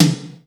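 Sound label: GATE tom; Crypton - DrumBase5000; GATE KITS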